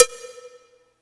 Cowbell, Yamaha PSR E305

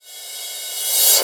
REV CRASH, Drums; USB Soundscan vol.11 - Drums Dance & Groove; AKAI

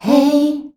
"HEY" D, FEMALES

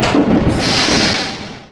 ROBOT; Best Service; Advanced Mega Effects

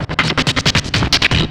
Break, Drum N Bass Percussion; Sonic Foundry (Sony Creative Software); Knutrix Drum & Bass; Fills n Breaks

